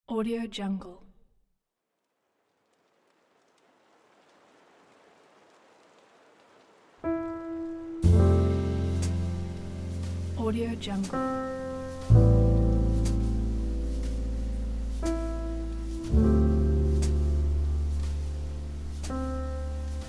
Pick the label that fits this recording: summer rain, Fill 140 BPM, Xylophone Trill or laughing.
summer rain